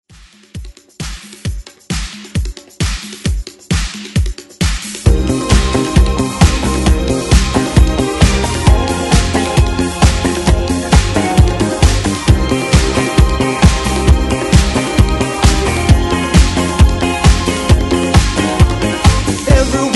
80s ReDrum 132 Bpm

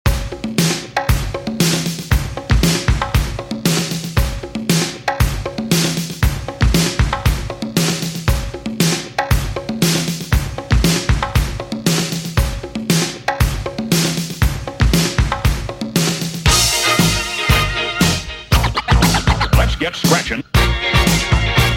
Scratch 80s Dj Intro Outro 117Bpm

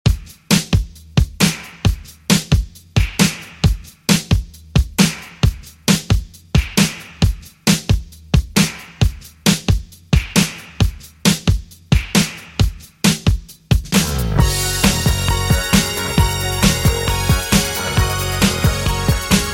80s, 134 Bpm; Redrum

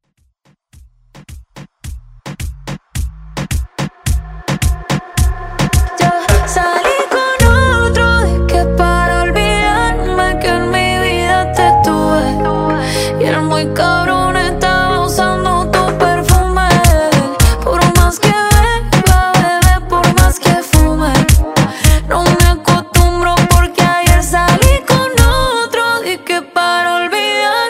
Reggaeton Intro

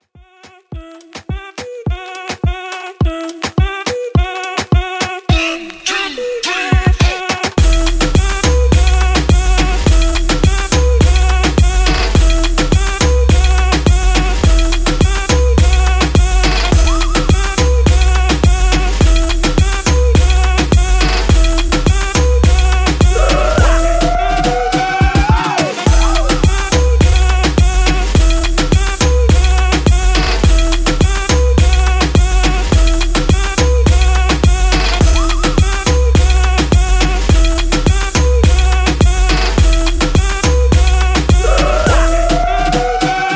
Intro 105 Bpm

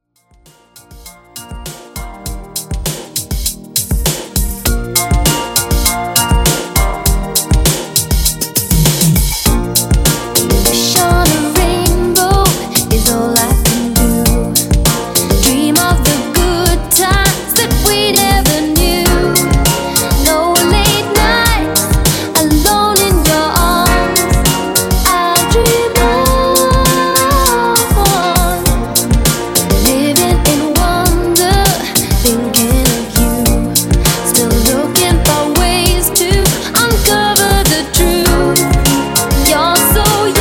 90s ReDrum 100Bpm; remixplanet

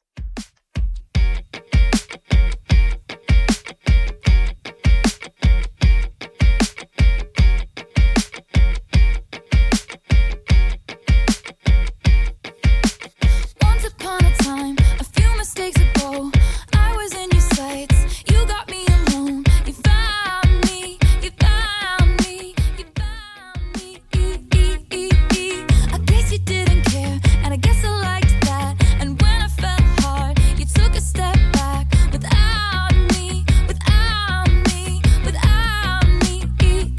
2ks Redrum 77bpm

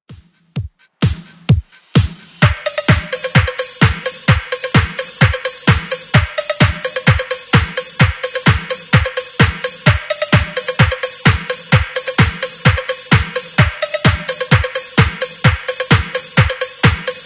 70s Redrum 129bpm

